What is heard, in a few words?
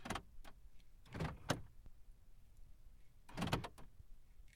Locking Sound